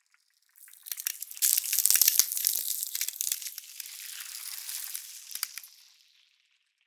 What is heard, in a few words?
Creative ice cracks